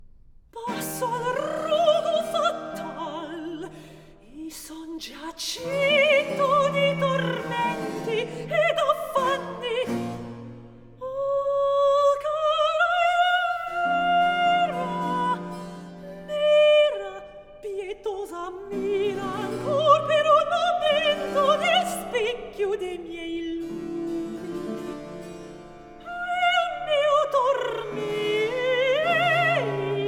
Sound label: Cantata for soprano continuo Recitativo; Cantata Elvira anima mia; RV 654; Passo al rogo fatal